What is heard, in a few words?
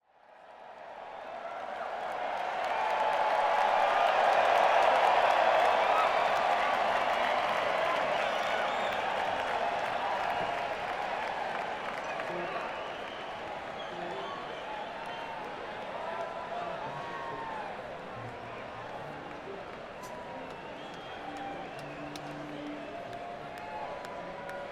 Crowd; Las Vegas, NV - MGM Grand Garden Arena